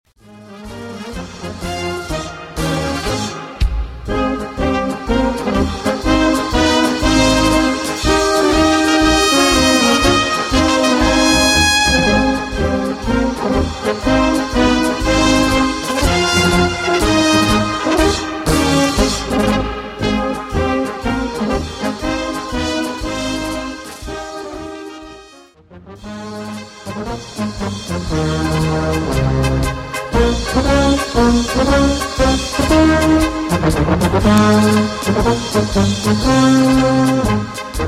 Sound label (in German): Blasmusik